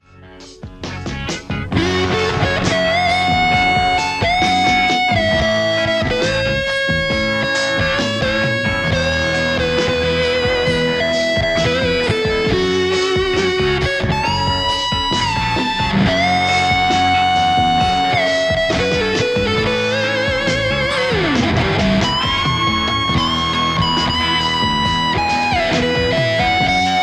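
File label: Stratocaster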